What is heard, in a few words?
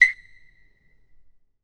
Basketball Court